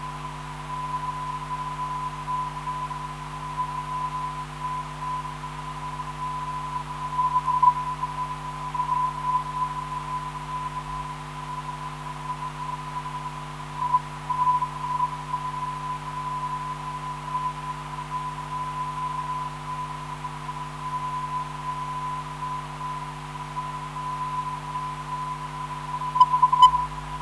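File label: ZL 403.6kHz, non-directional beacon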